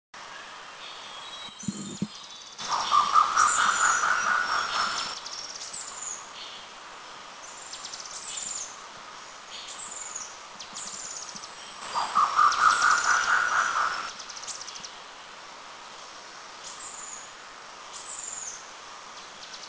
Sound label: Grey-Peacock Pheasant, Eaglenest, India